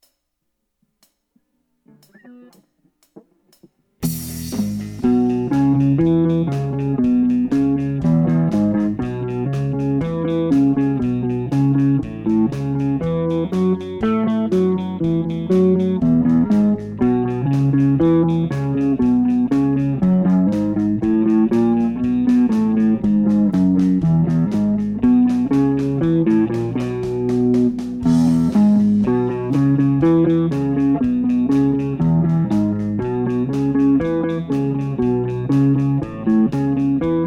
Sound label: Fast Walking Blues